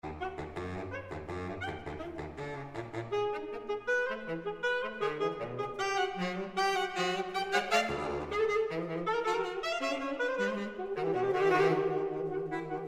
Fugue For the Saxes

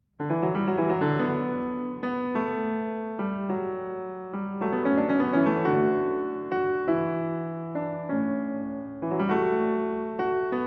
Prelude & Fugue in D Major, BWV 850 II. Fugue

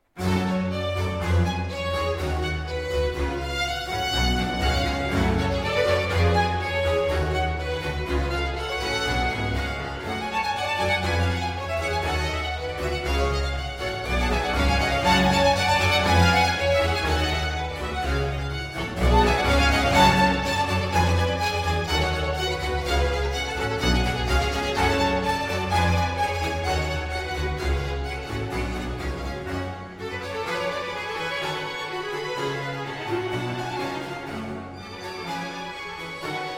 Concerto in G Minor Allegro

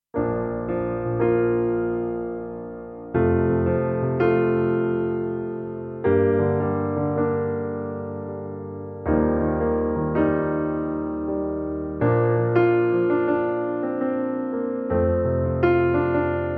Piano Version

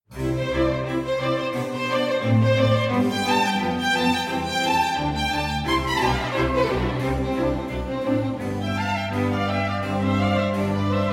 Bassoon Concerto in C Major, Allegro

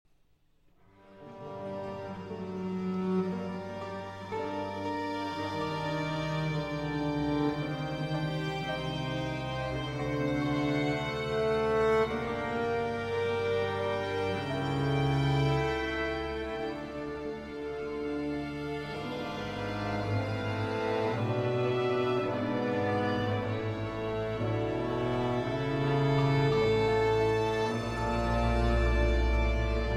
Concerto For Strings and Continuo in G minor

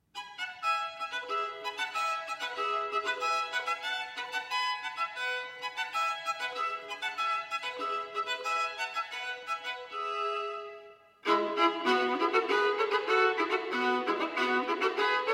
Solo Violin, E Major, Allegretto; 24 Caprices; 'La Chasse'

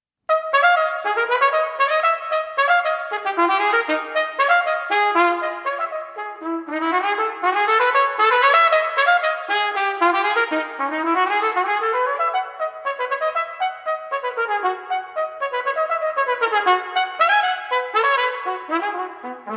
Unaccompanied Solo Trumpet, Dance; Suite For Unaccompanied Solo Trumpet II.

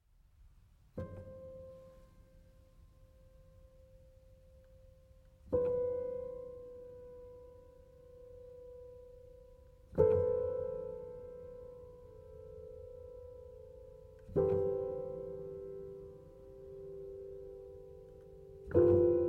Little Chorale, Upright Piano

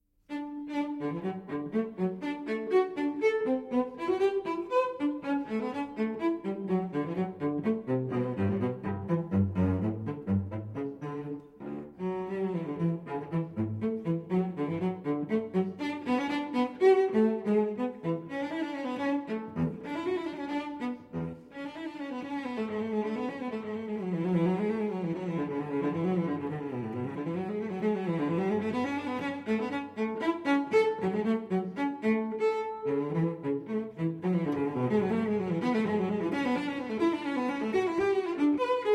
Cello Suite in D Major Courante; Cello Suite No. 6 in D Major, BWV 1012; III. Courante

